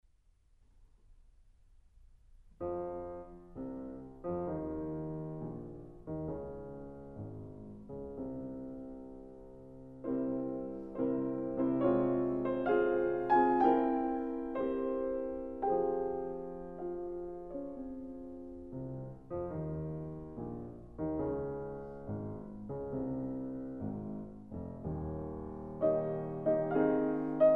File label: Fantaisie in F minor; Chopin